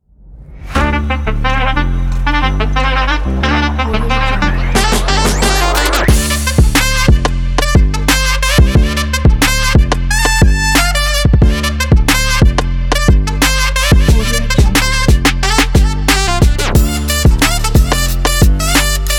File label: rnb